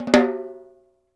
RUFF, snares; Sampleheads - New York City Drumworks VOL-1; SW SNARES

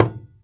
WAYANG KULIT A#6; Spectrasonics - Heart of Asia CD2

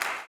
CLAP, Blues Soul; Spectrasonics Vocal Planet

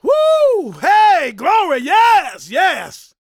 SHOUTS, Gospel, PREACHER; Vocal Planet Vol1 Gospel

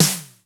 ELEC.DRUMS; Crypton - DrumBase5000

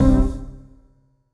HOUSE, House Dance

